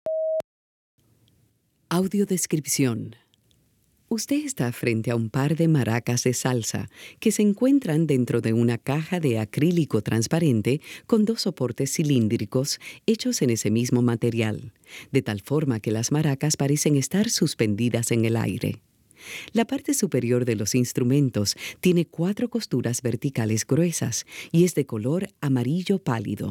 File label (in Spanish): Maracas